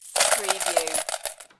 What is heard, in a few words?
Slot Machine Win - Coins Falling Into Payout Slot